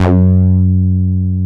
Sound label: TB 303